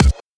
studio bass drum c sharp key

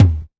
short tom drum sound d sharp key

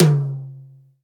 hi tom drum one shot c sharp key

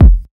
smooth kick drum sample f key; kick drum samples

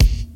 original kick one shot D sharp key; kick drum

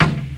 90s high floor reggae tom drum sound d sharp key

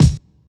kick single shot d key

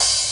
mellow open hat g key

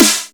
sizzly steel snare drum sound g sharp key; Duration 0:01

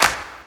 clap sound D key; percussion; single hits one shots

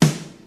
tight lows snare D# key; snare drum samples; one shots

